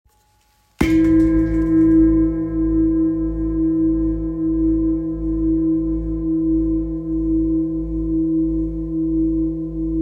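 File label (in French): Gros bol gravure pieds sol, bol chantant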